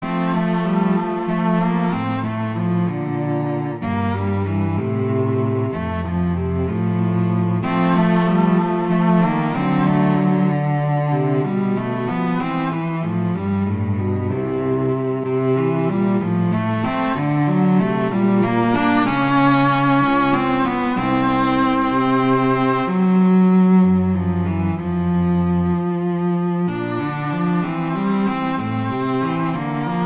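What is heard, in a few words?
Etude, cello; Popper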